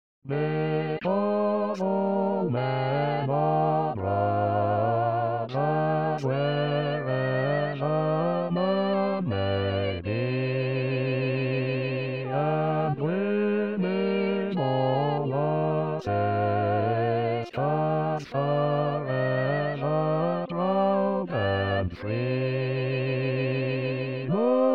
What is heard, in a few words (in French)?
Basse, Chorale